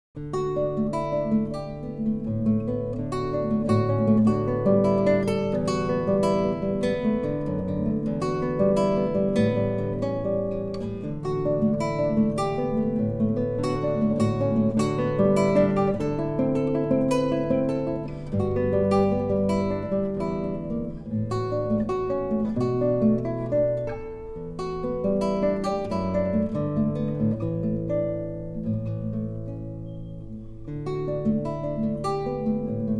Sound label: Prelude For Guitar